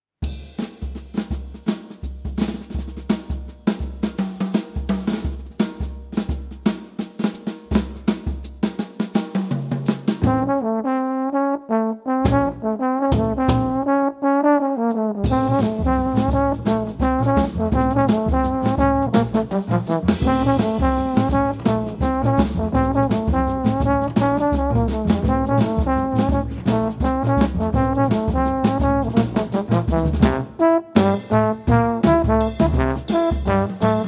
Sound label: waltz